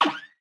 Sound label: wideshot fire start; Cuphead